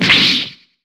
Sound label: damage